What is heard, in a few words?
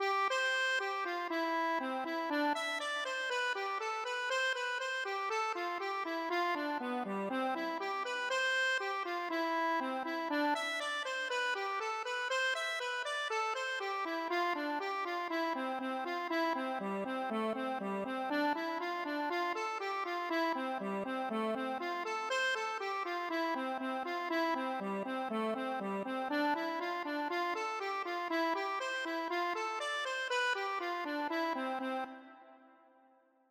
accordion